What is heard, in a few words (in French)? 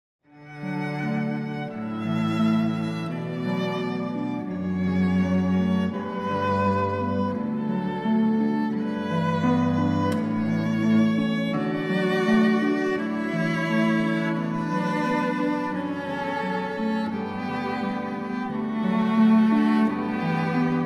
trio violon violoncelle harpe canon; pachelbel